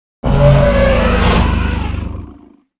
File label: dinosaur roar and snort